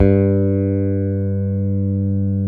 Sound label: BS P BASS, P.Bass